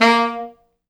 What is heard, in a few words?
A#2, Pop Brass; Best Service ProSamples vol.19; AKAI